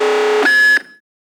defcon; DEFCON Alert Lamp; Alachua County EMWIN System

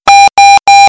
beep

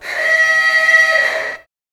gate active; Stargate activation alert

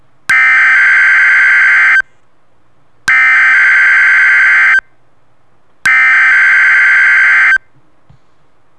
eas tones; Alachua County EMWIN System

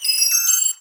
comms; Incoming Go'auld Comm Alert

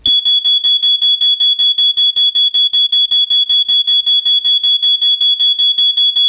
smoke alarm; Alachua County EMWIN System

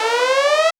base alert; Alachua County EMWIN System

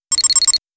triple beep sms; Alachua County EMWIN System

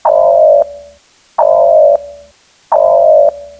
red alert; romulan

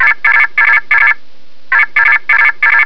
chirp communicator bajoran; Alachua County EMWIN System

